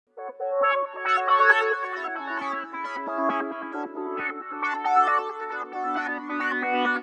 135 Chords; Zero-G Trance Inducer; 90 s Sample CDs

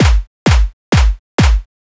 4 floor beat, trance

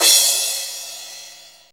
CRASH, Dance Mega Drums; Best Service

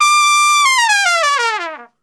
D 4, Brass Super Section, TRP; TRP LNGFLL; Best Service

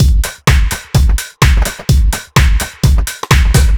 Drums, 127bpm, Nu Dance Electro; Zero-G; Soundclash; Combi Loops; Republic

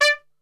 D 4 PIC SHT, Brass; Best Service; Brass Super Section

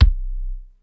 PZ Kick, Ensoniq ASR-X Pro

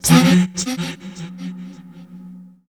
VOICE FX, Dance Vocals

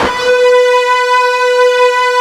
FUZZ, Guitar; ULT FZZ LOOP; East West; Steve Stevens Guitar Samples Collection

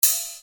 Hat, EuroDance

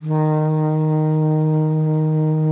D#3, alto sax; Xsample VOL-2

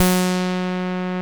TEISCO, SEQ-FX; Masterbits; Vintage Voltage

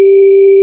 BLOW G3, PIPE; AKAI S-Series CD-ROM Sound Library VOL-8

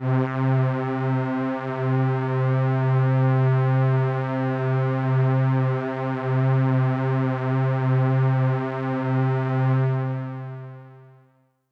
Pad C, Synth Prophet One Shots; Electronisounds

